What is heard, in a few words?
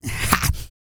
GRUNT, MALE HUMAN; ILIO; Vocal Planet